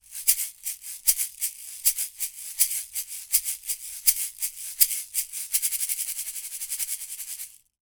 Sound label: Audio-Technica AT2020 test shaker